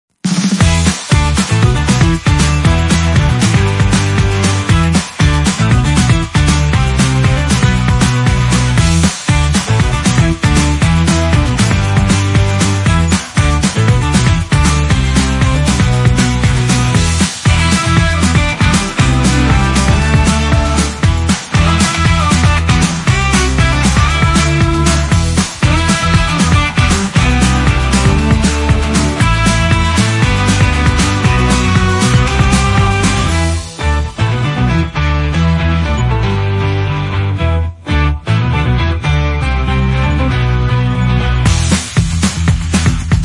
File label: Joyful Rhythm